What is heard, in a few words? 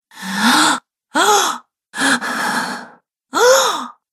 Woman Gasps In Fear Collection; Gasping